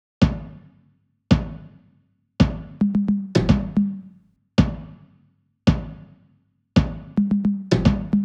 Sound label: Drum Loop 110 BPM Percussion; Experimental Drum Loops; Loop Stems; Cymatics; Mystery Pack Vol 4; Weekend Drum Loop